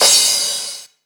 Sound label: Cymbals crash; Vengeance Samples